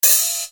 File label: Hat, EuroDance